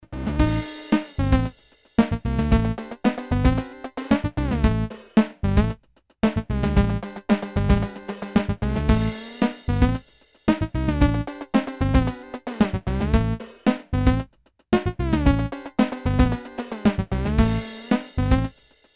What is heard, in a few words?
roland, freqbox moogerfooger; VCO: 0 SYNC: no WAVE:tri ENV AMT: 0 FM AMT: 0 MIX 4 con modulazione su VCO; Batteria e basso – Roland Handsonic; MF-107 freqbox moogerfooger